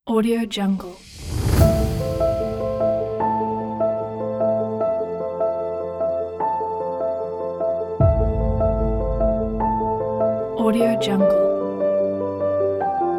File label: epic music cinematic